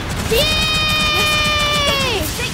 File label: YAAAAAAAY